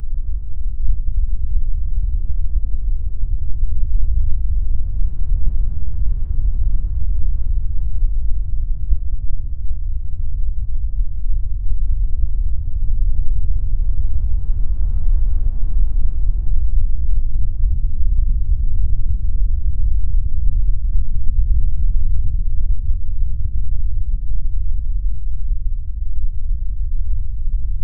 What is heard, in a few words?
a burning sound that soun